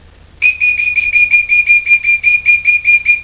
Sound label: warble